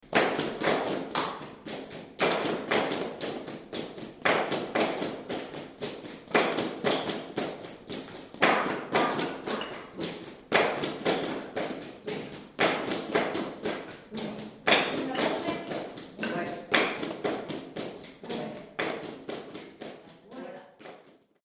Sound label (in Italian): colpi altrenati forte piano